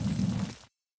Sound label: stone door pre